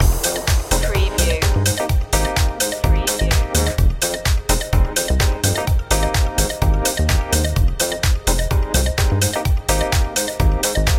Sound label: stash up loop